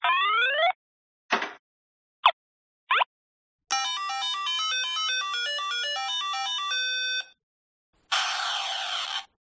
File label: music